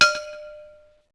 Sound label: klang